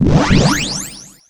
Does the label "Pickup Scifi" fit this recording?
Yes